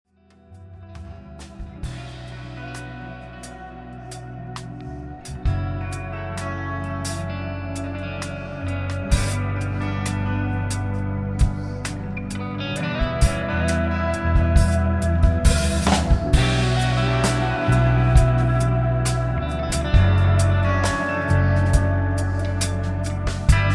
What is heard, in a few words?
Instrumental (Live)